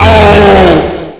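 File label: die, alien controller; ghouls forest2; bzomb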